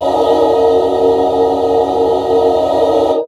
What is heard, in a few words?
guitar; columns; Dynamic DWM